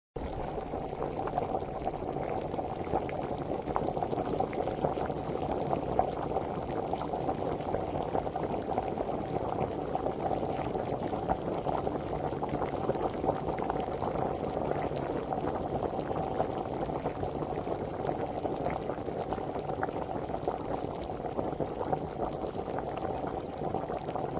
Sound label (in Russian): Kipenie